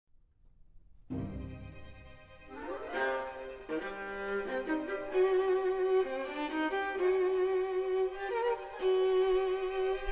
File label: Viola Concerto in C Minor Allegro assai